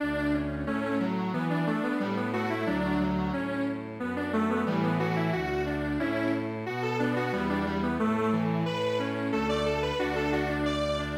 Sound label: Strings Quartet